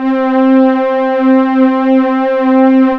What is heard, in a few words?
STRANGS, OBERHEIM; OLD SYNTHS; Rubber Chicken Software Sample Bank